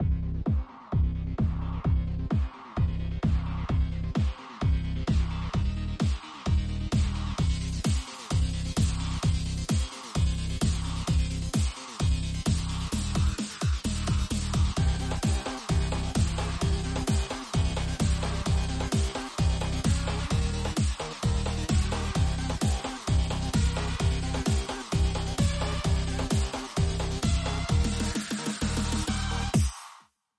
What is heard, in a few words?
130bpm Alt Percussion